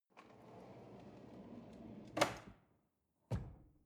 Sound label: drawer cutlery close draw soft